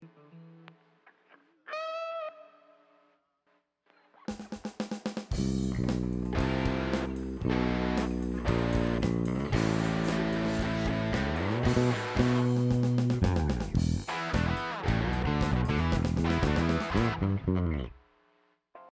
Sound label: Strings Mix; ending